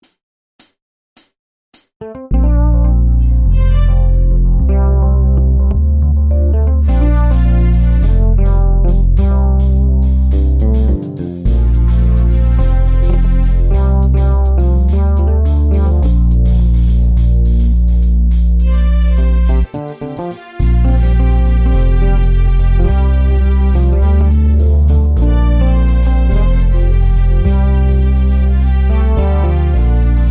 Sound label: Celtic Song